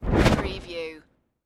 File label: Magical Whoosh Pan